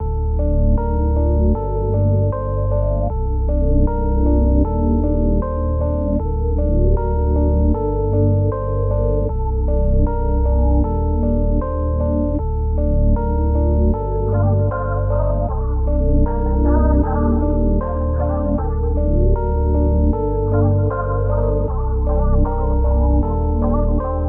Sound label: 155 BPM G Min; Cymatics; Houston